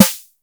SNARE, DRUMS; Music Store Sound Service; Einstein

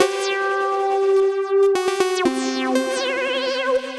Disto Stomp Mix A 120 C, modular 120bpm; modular madness

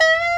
bawu D#3; AKAI S6000 CD-ROM - Volume 1

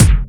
DR-660 Kick, Boss DR-660; 90 s Sample CDs; 300 Drum Machines

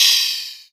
DRM HAT Deep Flanger; Polyend; Plughugger

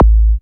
BD SYN, Korg EMX-1

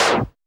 TEK-FIRE; TEK PERC3

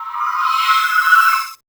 SPOOKS, SPIRAL WINDS; Spectrasonic Distorted Reality 1

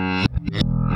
Backwards, Bass, Effected; Bass X

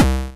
Kick, Drums; Bitkits

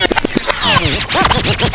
backscratch, scratches; Zero-G; Freak Beats

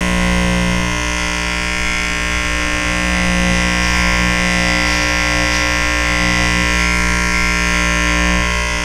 RAZOR BUZ, Motor; SFX

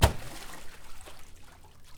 water slap; E-MU Producer Series Vol. 3 – Hollywood Sound Effects; Water Slaps

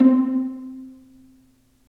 STR P, Roland String Master Series, Vlas Pizz

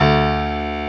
PIANO D1; St.Piano&Strings

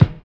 LO FI BD, Drums Dance & Groove; USB Soundscan vol.11; AKAI

